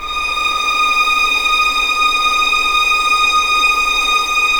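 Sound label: D#6 legato violin, right, The Ultimate String Collection; East West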